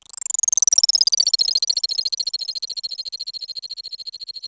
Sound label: clicker C4